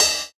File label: Cymbal, jungle breaks drum sounds